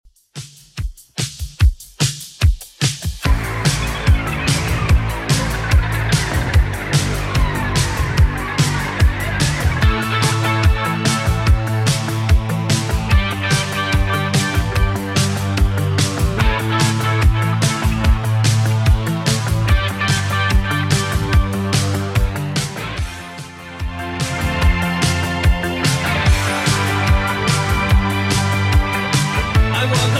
80s Redrum, 146 bpm

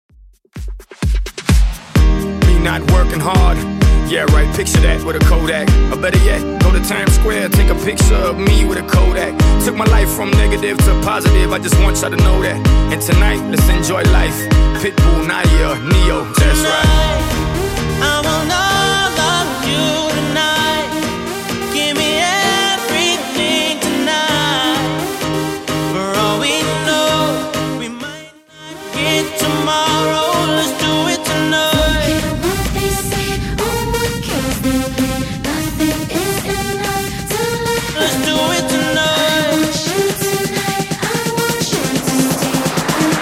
2ks Redrum 129bpm

